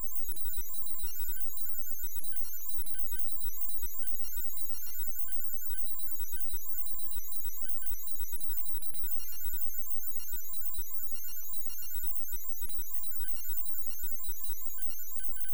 Computer loop; 3D Scifi Kit Starter Kit HD; Raumschiff

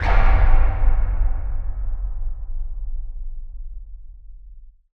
LOW END IMPACTS; LOW END IMPACTS VOLUME ONE by ROHHSA

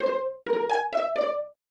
complete, fx; Top Down Adventure Assets

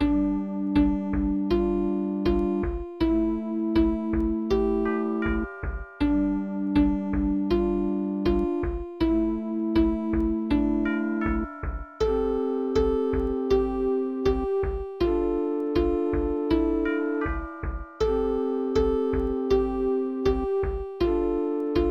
ghost town, music; Top Down Adventure Assets

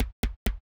enter, fx; Top Down Adventure Assets

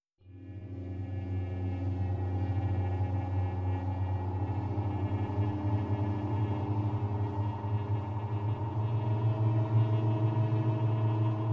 ice beams, horror music; The Void; juanjo sound

